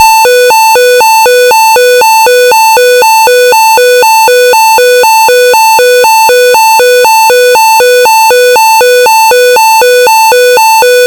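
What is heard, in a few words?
Alternating, combined signal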